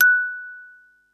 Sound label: mallets